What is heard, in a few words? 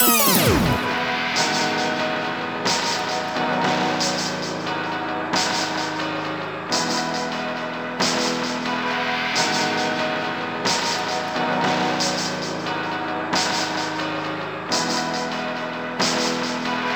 Jingle slow; Blinx 2 Masters of Time and Space; Xbox